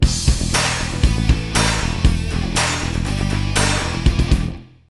Rock and rythm; Worms World Party

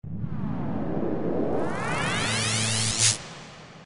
Teleport; Acid Rising; PlayState

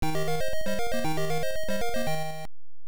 Block Clear; Lode Runner; arcade; Irem M62

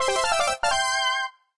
Lvup; The Denpa Men; Android

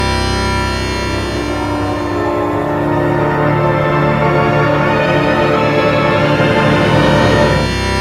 evil chordz; brainpipe

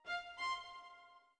orbit violin; Sky Children of the Light gamerip

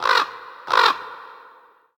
Crow; Env Fauna

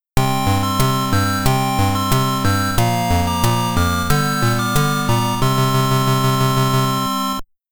Clear (Over Par)

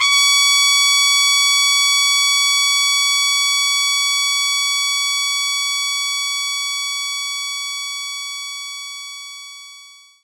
Trumpet G5; knights try

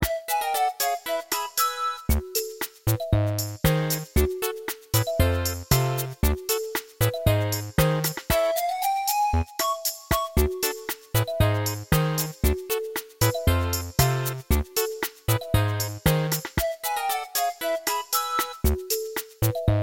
Minigame Lose; animal crossing amiibo festival

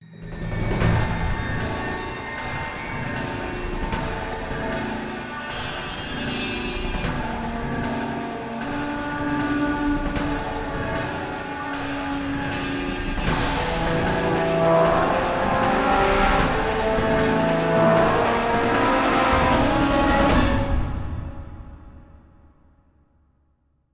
cargo train crash; F.E.A.R. 2: Project Origin

